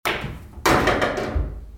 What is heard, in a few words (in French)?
Billiard boule rentrée